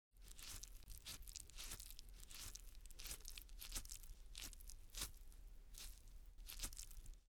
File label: Cat licks itself